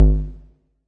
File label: ANALOGUE BASS, Ultra N Box 404; drum machines